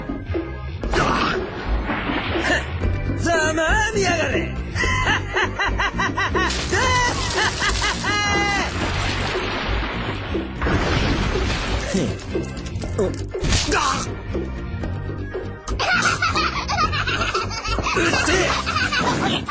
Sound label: Laughing; Ichigo